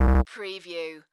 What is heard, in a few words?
Slot Machine Musical Ditty